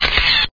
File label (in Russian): zvuk kameri